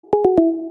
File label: google hangouts leave